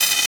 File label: Static; Blastwave